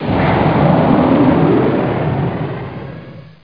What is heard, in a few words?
pwr on